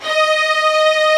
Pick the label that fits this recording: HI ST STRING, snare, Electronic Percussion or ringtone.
HI ST STRING